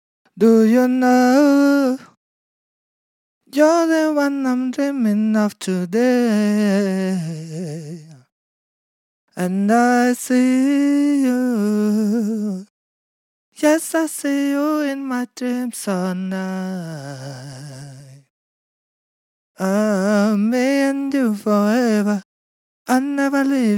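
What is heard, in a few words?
Pop RnB, 100, E major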